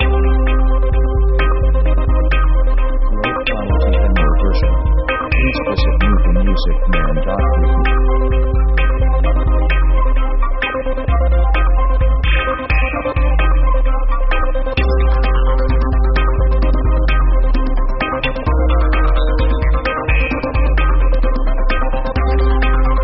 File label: action trance techno